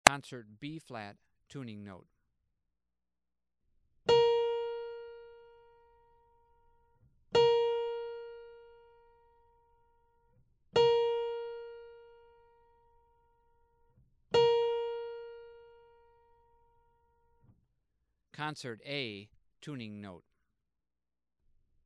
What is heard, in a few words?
Tuning Notes; James „Jamey“ Aebersold Play-Alongs